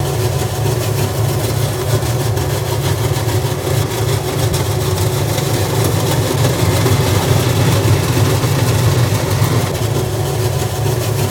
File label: Propeller Mvmt Above, propeller movement